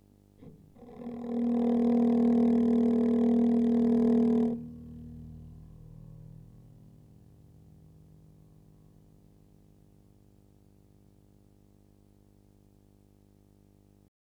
39Hz rot

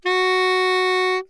G sharp 4, soprano saxophone

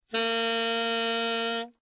C5 alt, tenor saxophone

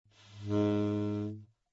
Bb3 very soft, tenor saxophone; Bb3 dynamics

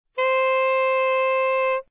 D5, soprano saxophone

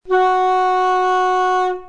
F sharp 4 trill, flute; modern

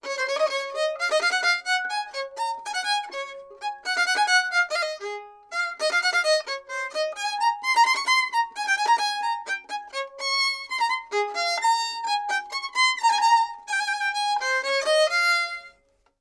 Modern Bow Gut No Shoulder Rest; Handel

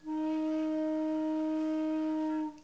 E4 no key baroque, flute

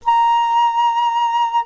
A sharp 5, flute; modern

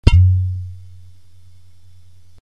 Helmholtz

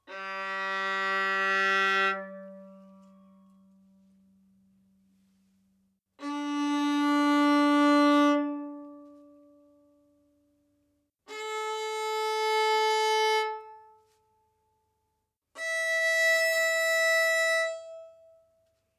Open Strings Modern Bow Modern

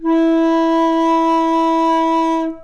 E4 alt, flute; modern

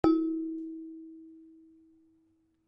bellplate 2 modes; bellplates

